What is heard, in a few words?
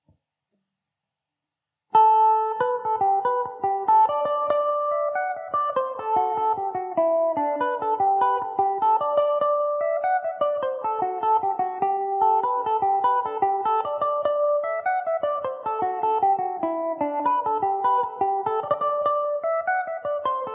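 G major mandobird, irish mandolin; The Dabchick; Epiphone Mandobird VIII electric mandolin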